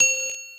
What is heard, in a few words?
bell; openspace3d; kinectdemo